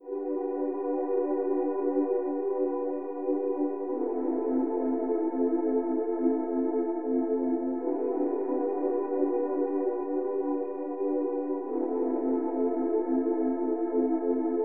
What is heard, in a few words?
Halo